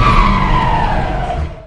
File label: Turbo